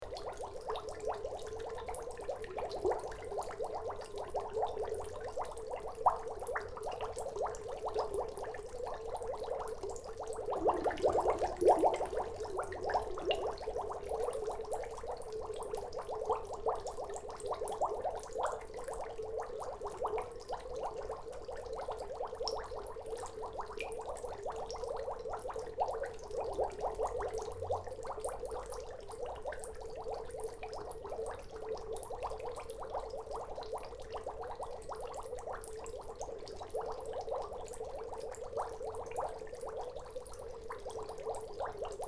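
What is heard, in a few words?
bubbles in water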